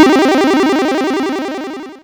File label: synth, amiga med